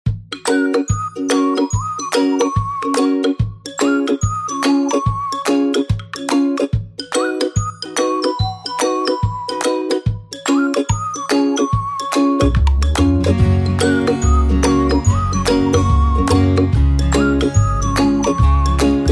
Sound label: Happy Ukulele Background Music Pure Fun Instrumental Background Music